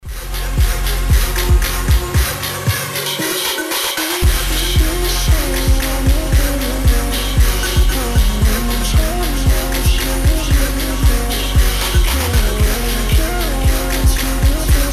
slowed reverb

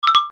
spawn sound; slime rancher

